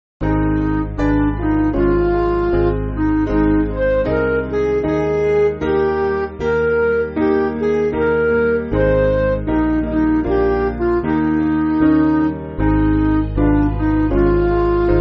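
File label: Midi, Music Backing Files for Churches; Hold Thou My Hand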